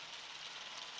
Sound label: Background Noise Clips